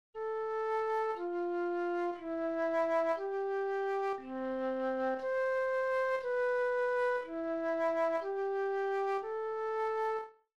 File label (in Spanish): melodicos